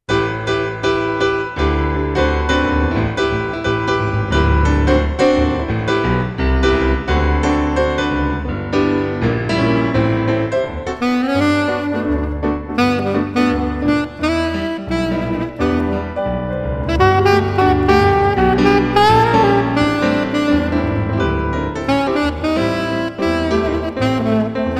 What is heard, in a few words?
sax